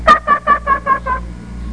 hahaha

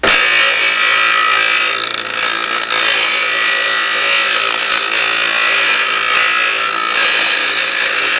Grinder

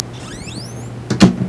Door Closing; Sound Library 2000 - Wayzata Technology; Household

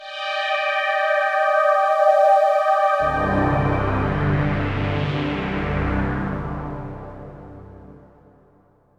SYNTH PAD AMBNT 160 C, Ambient; eJay; Sphere

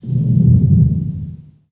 implode

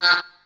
Wah gtr; guitarras

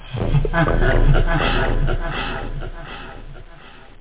laff; boombox demo

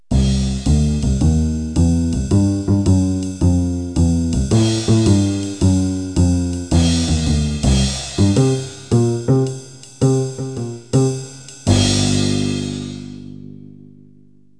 Jazz Bass Drum; Music

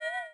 ARROW; Le manoir hanté

